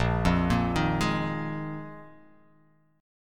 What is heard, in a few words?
Bb 7th Suspended 4th Bb; arpeggiated; JGuitar